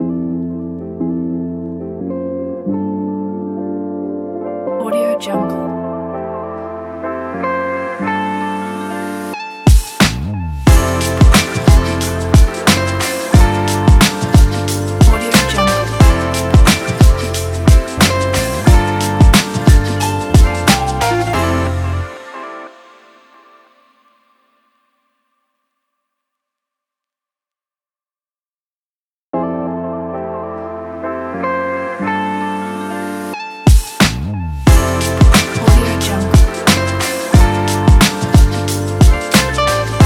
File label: lofi hip hop vlog logo intro